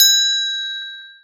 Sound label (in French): Sonnette